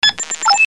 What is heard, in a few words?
input ok clean, computer